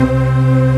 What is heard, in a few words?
Full Organ C2; Proteus 2000; Multisamples; Digital Sound Factory Proteus 2000